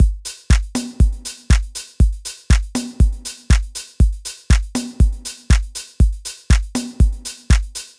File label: drumloop